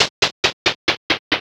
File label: Stairs Down; Walking up and down stairs; Zelda